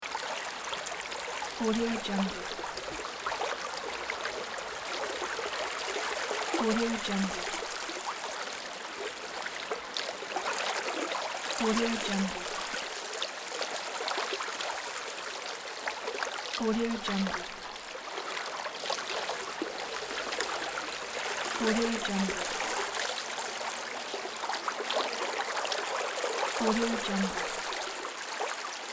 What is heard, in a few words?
river